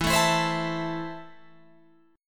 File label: E Suspended 4th Sharp 5th, Guitar Open F; Esus4#5; strummed